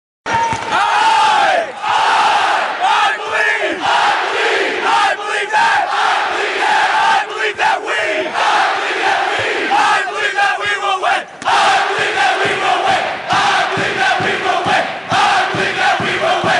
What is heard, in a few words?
Chant